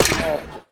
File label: hurt, copper golem, oxidized; Minecraft